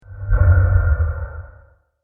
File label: Alien Airlock; Alien Sound Effects; science fiction sound effect; star wars / trek style games; Andrew Fleming